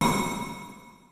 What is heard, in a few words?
MEGA KIT, DRUMS; Kid Nepro; Elite